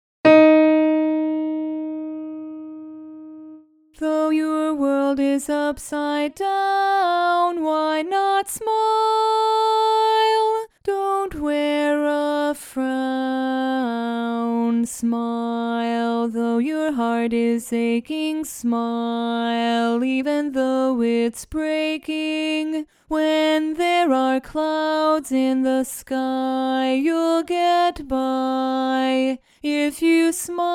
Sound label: Bari Alone